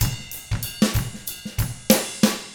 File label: 95 FUNK, Drums; ILIO; Double Platinum Drums 1